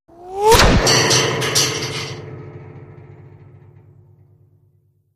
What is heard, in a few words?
Bullet with Whir Impa, metal